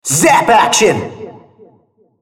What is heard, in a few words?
spell cast effort; RumbleSkin03